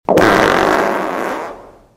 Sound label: fart